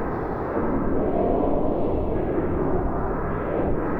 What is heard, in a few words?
Sample n Hold Verb Loops, sparse soundscape